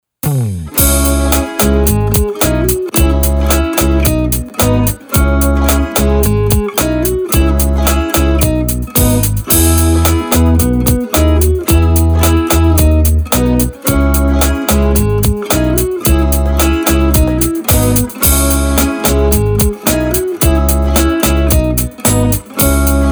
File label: relaxed chilly